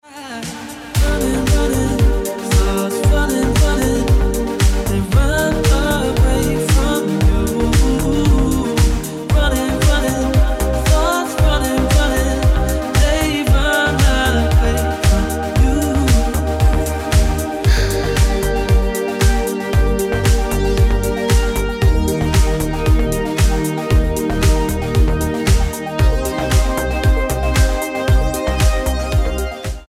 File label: Ringtone